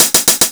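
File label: Roland Tr-909 closed hi-hat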